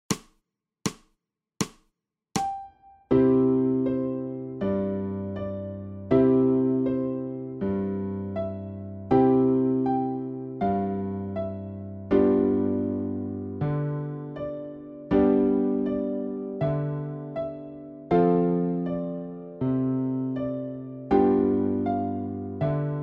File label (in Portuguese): PLAYBACK ALTO